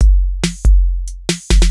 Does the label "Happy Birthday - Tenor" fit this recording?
No